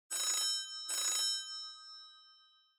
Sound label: Smartphone Ringtone Dry